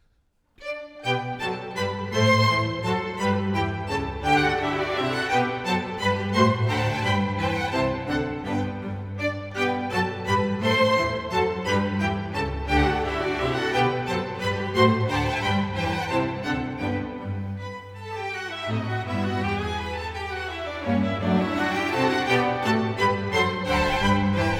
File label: Serenade in G major - Menuetto; KV 525 'Eine kleine Nachtmusik'; Mozart; Serenades